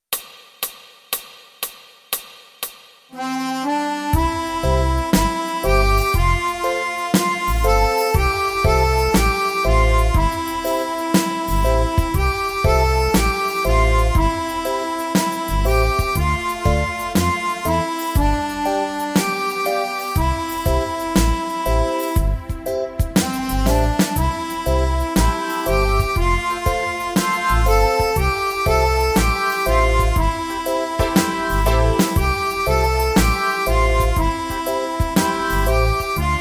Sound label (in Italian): Fisa 1 2 mani tutti